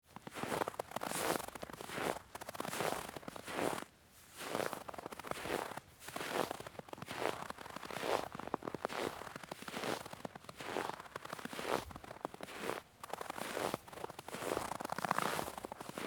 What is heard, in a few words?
footsteps snow; foley